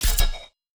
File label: Kill